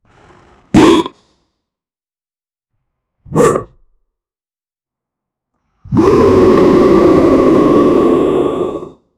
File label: a massive spinosaurus bit, attack